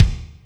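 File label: kick Slam Room, Alesis D4 drum machine; 300 Drum Machines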